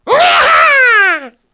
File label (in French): RATOCRI; Mobiclic46